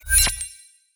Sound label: ui shop upgrade